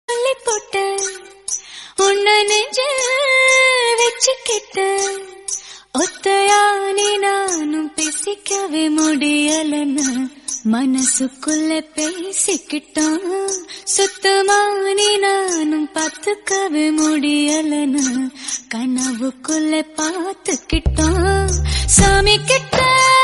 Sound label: srilankan ringtone